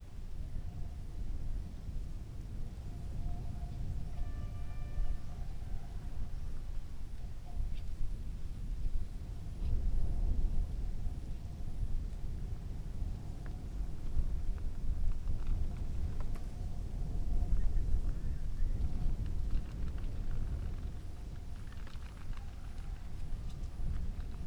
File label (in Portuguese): Vento fraco ruinas com grilo, passaros, poucas folhas correndo pelo chao e; pessoas l